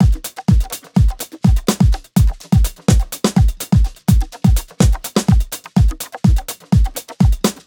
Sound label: skippy, 125 Beats, Nu Jazz House